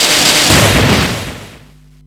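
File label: Magic Thunderall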